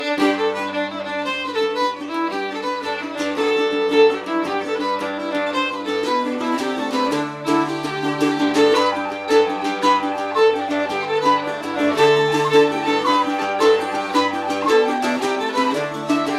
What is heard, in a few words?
Orchestra Ambience; Assassin's Creed III